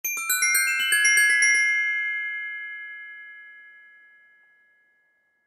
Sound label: Glockenspiel Melody Twinkle; Mallet Sound Effects; Musical; Cartoon